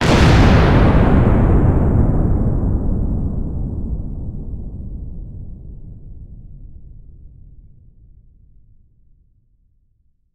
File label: Tactics Circus Explosion; This Is the Police 2